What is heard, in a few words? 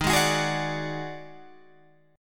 D sharp Minor Major 7th Double Flat 5th D sharp Guitar Open G